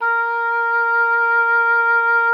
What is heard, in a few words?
A#4, OBOE; Xsample VOL-6